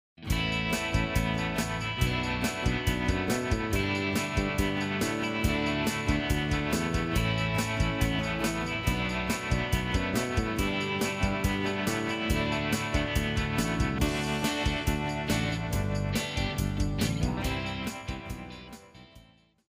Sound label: rock